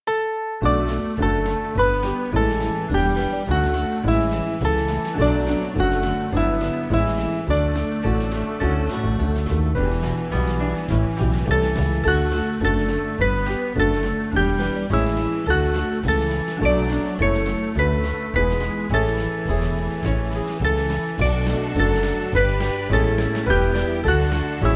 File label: Band; Father Whose Will; Stoerl